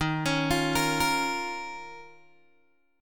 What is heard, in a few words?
D sharp 7th Suspended 2nd, Guitar, Open G